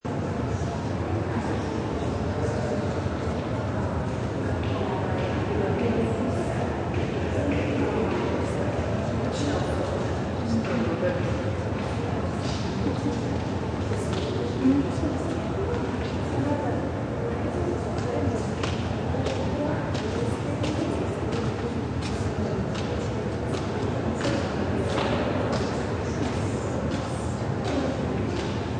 Busy Museum Ambience, Background ambience of a busy museum.; Buildings; Backgrounds